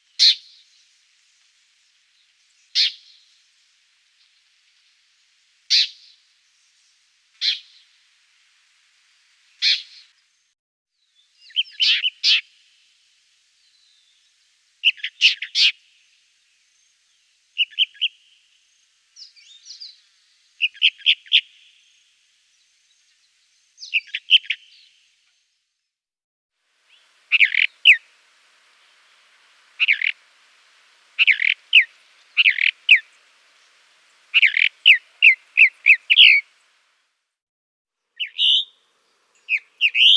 Moqueur des savanes Tropical Mockingbird, chants des oiseaux; Guadeloupe

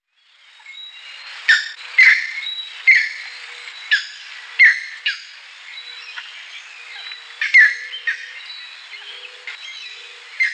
Heron vert Green Backed Heron Green Gaulin, chants des oiseaux; Guadeloupe

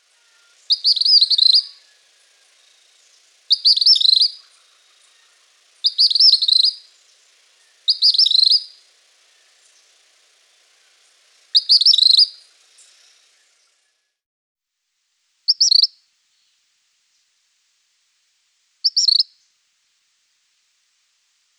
Tyran gris Gray Kingbird, chants des oiseaux; Guadeloupe

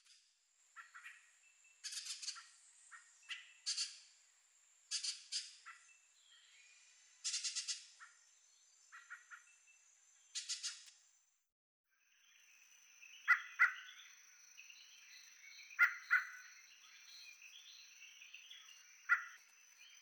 Grive a pattes jaunes Forest Thrush, chants des oiseaux; Guadeloupe

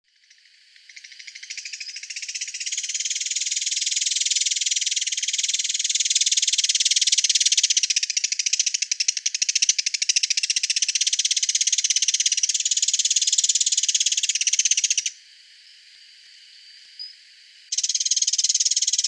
Paruline cafeiette Plumbeous Warbler, chants des oiseaux; Guadeloupe

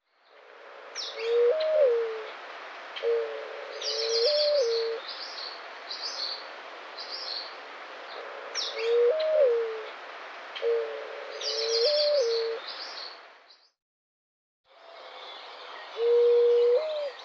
Tourterelle queue carree Mountain Dove, chants des oiseaux; Guadeloupe

